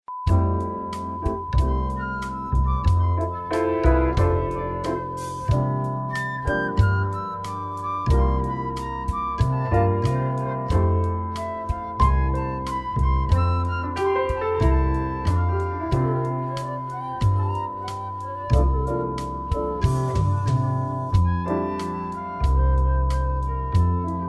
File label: cheerful time